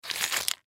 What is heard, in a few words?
Plastic Bag Pick Up Cement; Foley; Blastwave